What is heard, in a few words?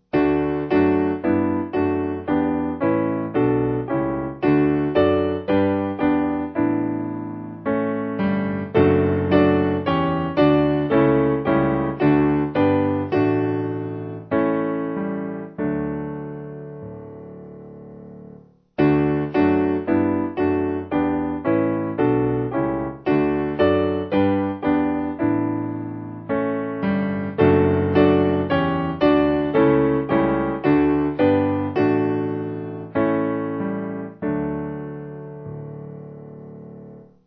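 Piano, church music backing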